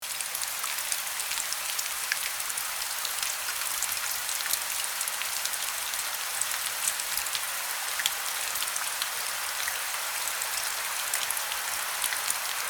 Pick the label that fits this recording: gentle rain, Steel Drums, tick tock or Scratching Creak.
gentle rain